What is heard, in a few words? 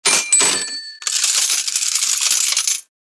Purchase ding; shorts sound effects